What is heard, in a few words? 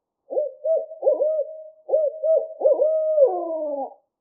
Barred Owl Phrased Hoot